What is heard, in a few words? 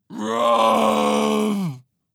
Roar Goddess